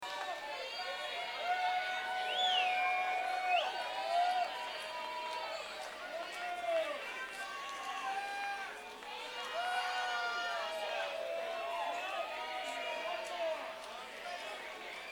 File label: Applause